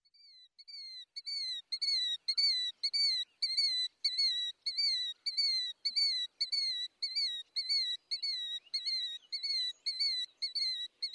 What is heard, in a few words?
killdeer